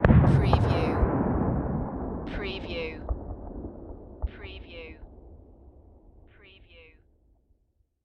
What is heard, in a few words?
Bomb Distant; sound-effects-bible